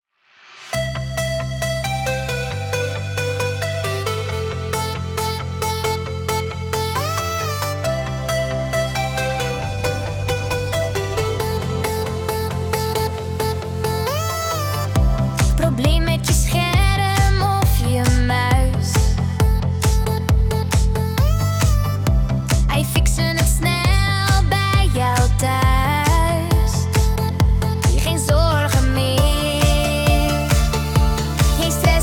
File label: Jingle; Computer Service Eerbeek